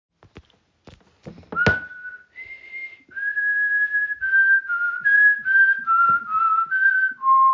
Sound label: Bach Fugue Whistle